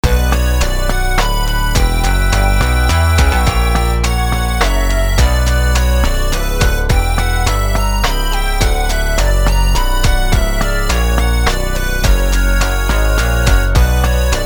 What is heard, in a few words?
orchestral suite no.2 minuet; Bach’s Orchestral Suite No.2 (Minuet) BWV 1067